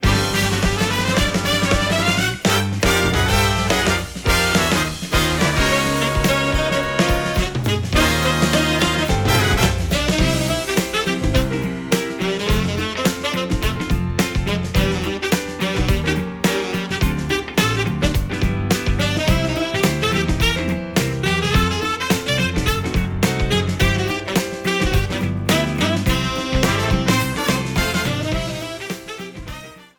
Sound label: Big Band Arrangement; SM64